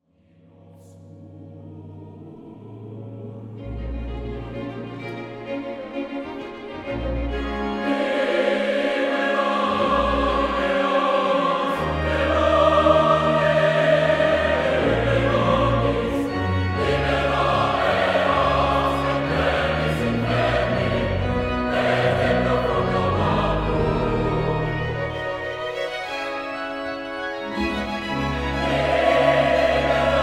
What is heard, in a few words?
chorale